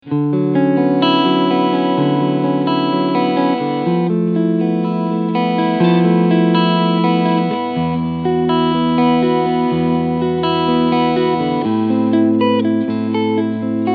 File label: Solar Guitars SB1.6 Clean Sound Front Humbucker SC Modus